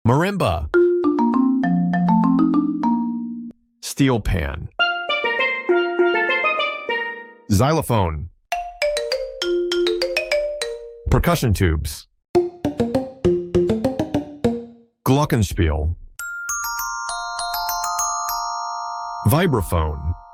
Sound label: theme on different instruments satisfying music; peppa pig; marvinblend